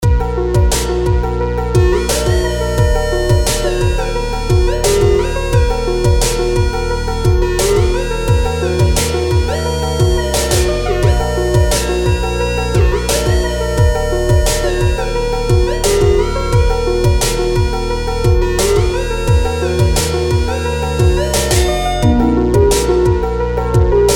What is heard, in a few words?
95 bpm